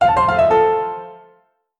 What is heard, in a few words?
a short success sound effect